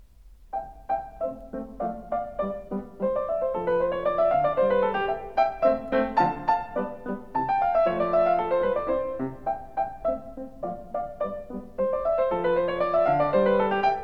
Piano Sonata No.15 in C major III. Rondeau Allegretto